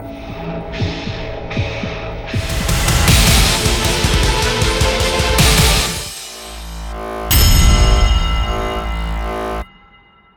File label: jingle